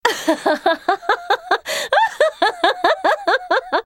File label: laugh; Miss Fortune